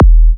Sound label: Minimal Kick, Drums, Kicks